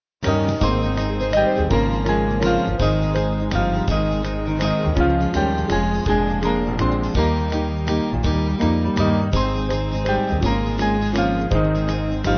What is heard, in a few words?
It Came Upon Carol